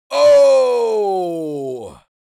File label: Unexpected male reaction